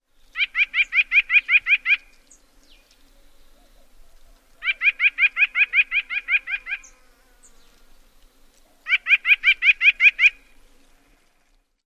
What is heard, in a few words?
song